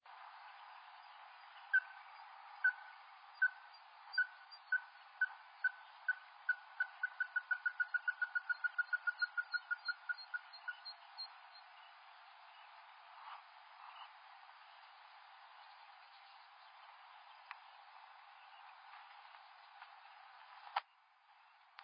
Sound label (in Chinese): 緋秧雞 紅胸田雞 Porzana fusca